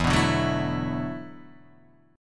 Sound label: E 7th Suspended 2nd Suspended 4th, down, Guitar, Open D